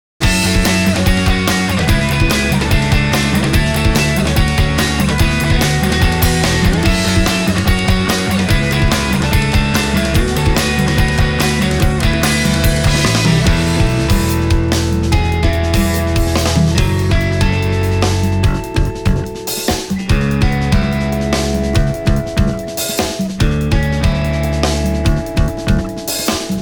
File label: Instrumental; Gintama